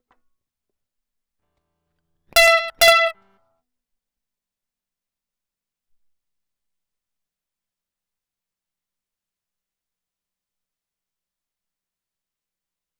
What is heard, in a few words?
Roland GK3 Left channel and GK Extreme Righ Channel